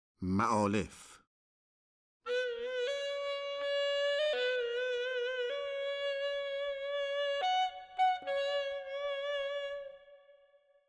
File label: Ma'alef Homayun Ney; Dastgah Homayun